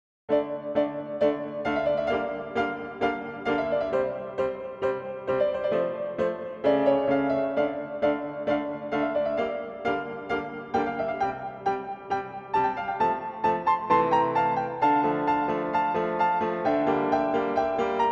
minuetto

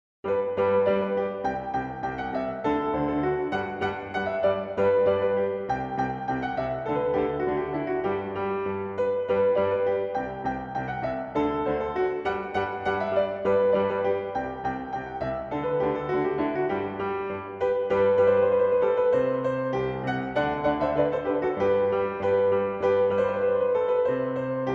minuetto

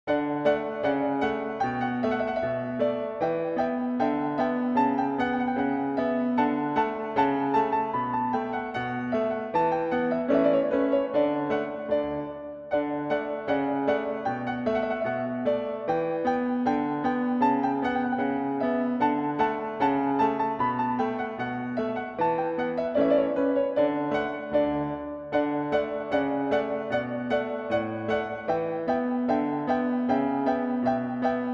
minuetto

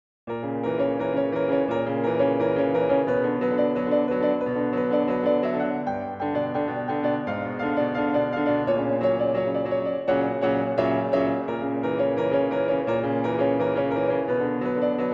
minuetto

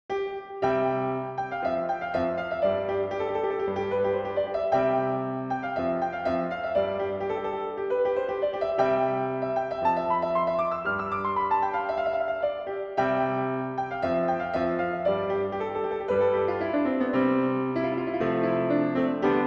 minuetto

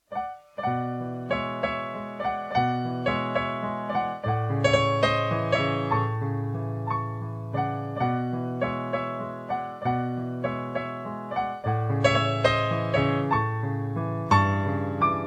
Valzer in Mi Maggiore

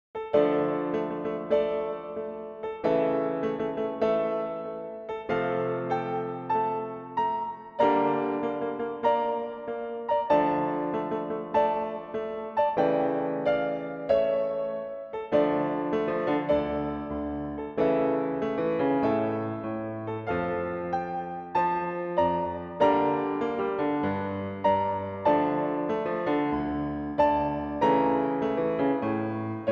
concerto per piano; Op.132 n.4; II mov

